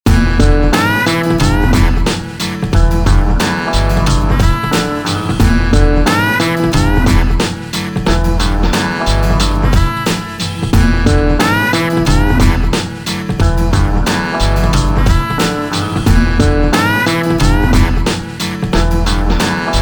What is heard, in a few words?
loop funky